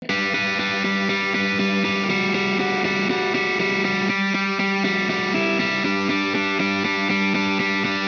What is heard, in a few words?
amp wet guitar distorted; Morphdrive; Demos for hybrid configurations